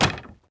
Wood Arrow Hit

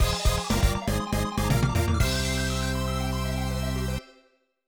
Jingle; Hot Shots Golf; PS1

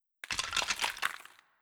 Catapult Bones

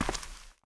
Nomal Wom Walk; El Nomal Wom Walk

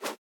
Window Open

